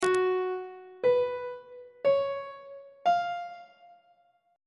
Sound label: g flat major 7th suspended 4th chord intervals; on treble clef